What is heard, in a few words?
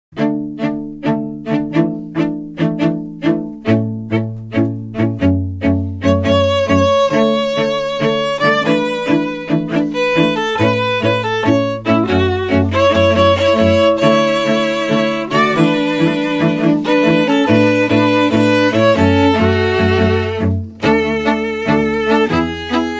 strings